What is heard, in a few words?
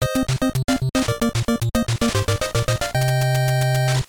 stage clear; castlevania